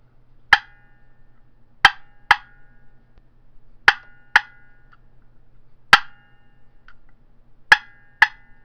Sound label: golden deluxe clucks, turkey; Southland Sounds